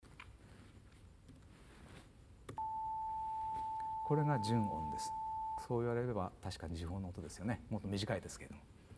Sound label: F2-440Hz